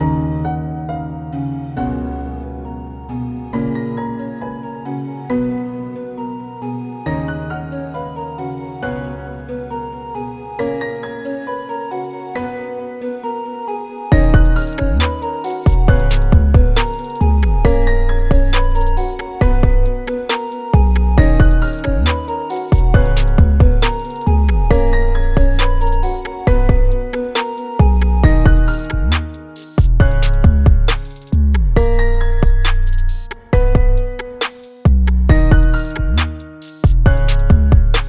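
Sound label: Key D minor 136BPM Full Mix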